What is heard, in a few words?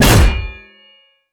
hitsound heavy